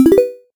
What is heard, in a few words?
Song Decide; OpenTaiko